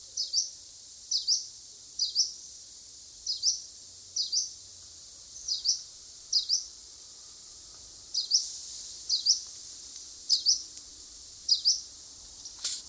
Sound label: Phylloscopus humei; scanzano